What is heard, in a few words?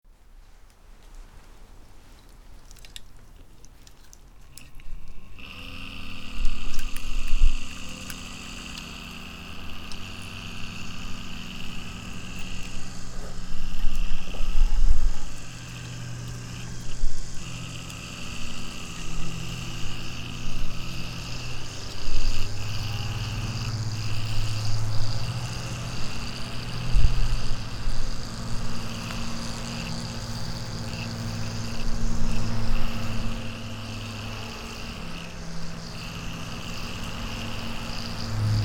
Fan Noise